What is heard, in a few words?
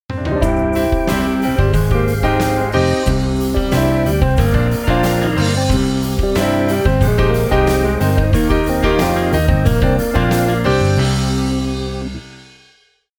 Mixing Mistake Wide Mix